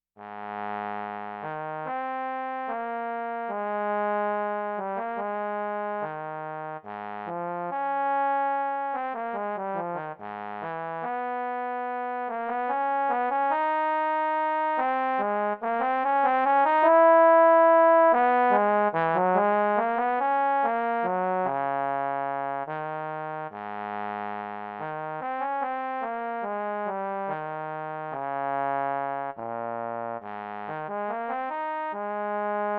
Euphonium Lyrical

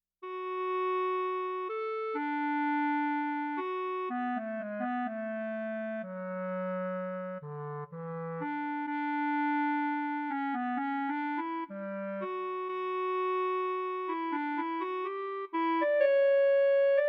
Bb Clarinet Lyrical

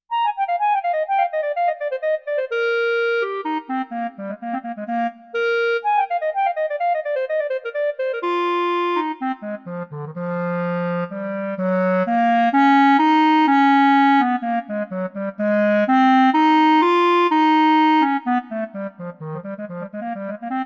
Bb Clarinet Technical

